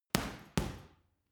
boxing punching bag side hook double hard; sport freizeit; boxen

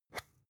spice jar scratch tonal open fast; industrie wirtschaft; gastronomie

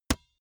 basketball single hand catch hard

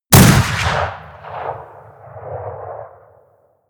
rifle remington rolling block crisp stone; schusswaffen

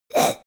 monster gasp; kreaturen

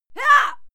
female fight scream hyah; schreie; menschen

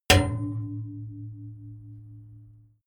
metal impact small hard long

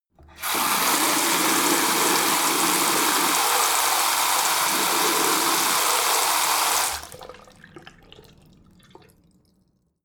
tap flow ultra hard long; haus garten

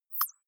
click synthetic light, signale

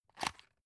drug open; arzt

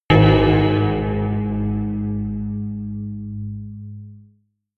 melodic muted metal, hits impacts; whooshes rises

